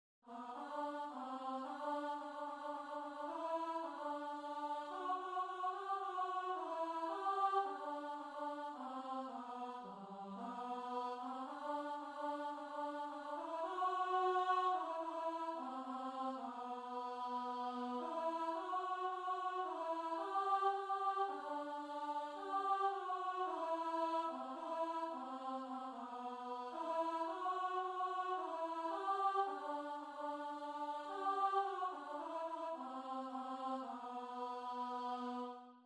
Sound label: DOWN TO THE RIVER TO PRAY ALTO